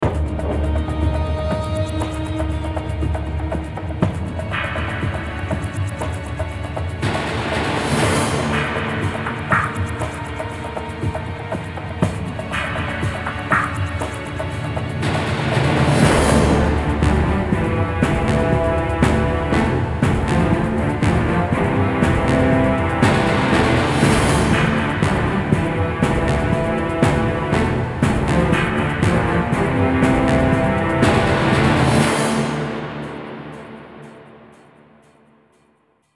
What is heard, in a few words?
music reveal enemy pos; freelancer-hd-edition